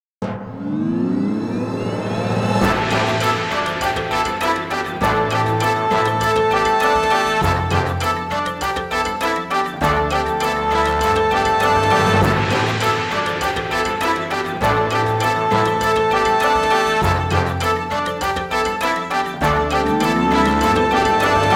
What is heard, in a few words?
playing the echoing horn; ar tonelico